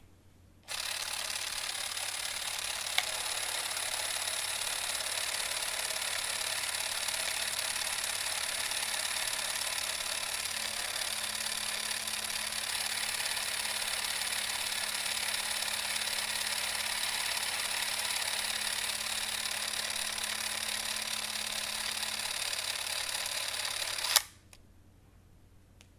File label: Super8 Zoom In And Out